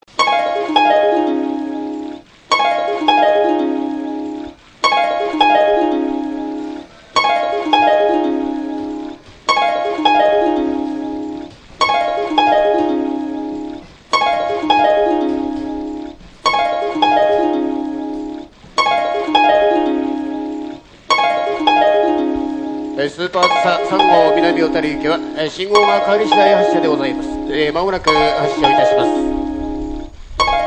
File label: shinjuku melody, Tokyo Train Tunes; japan